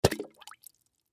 Cup Insert Upside, Water Cup Insert Upside Down In Bucket Splash; Splash Sound Effects; Splashes; Objects; Blastwave